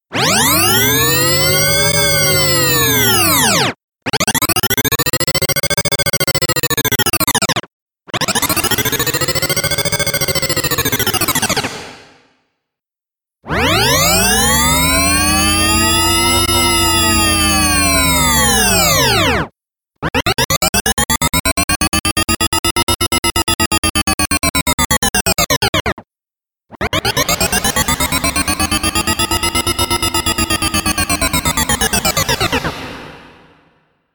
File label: Fake Shepard; Oldfreshfx